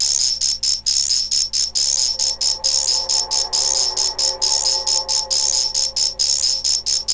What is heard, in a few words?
DRUM, 132; DRUM LOOPS; Megabass Remix! 2